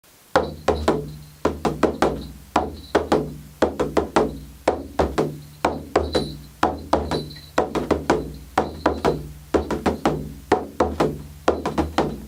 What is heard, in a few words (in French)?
son pandeiro